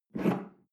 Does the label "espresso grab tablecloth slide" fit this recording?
Yes